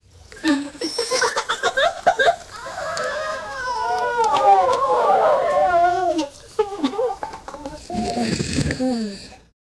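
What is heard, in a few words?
family softly gasping together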